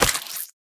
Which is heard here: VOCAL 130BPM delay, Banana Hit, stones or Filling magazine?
Banana Hit